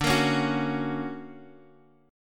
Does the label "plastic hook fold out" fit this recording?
No